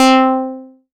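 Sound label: pluck C4, Moog Minimoog; Leads and Keys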